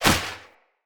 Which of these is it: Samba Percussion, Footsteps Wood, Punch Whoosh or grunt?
Punch Whoosh